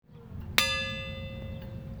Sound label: lighthouse bell; quinns